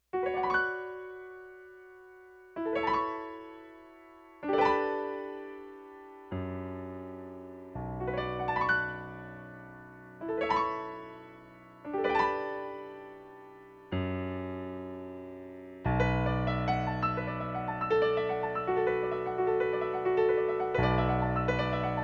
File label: BARITONE BASS